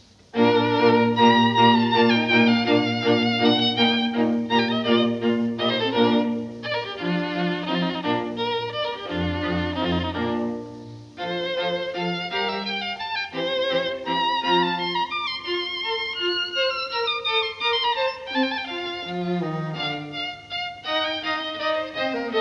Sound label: String Quartet; Haydn; String Quartet No1 Hob3-1 La chasse; Pro Arte String Quartet